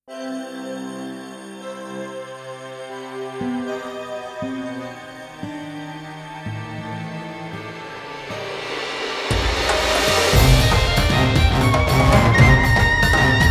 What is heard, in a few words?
Lullaby; Monster Hunter Hunting Music Collection II Roar Chapter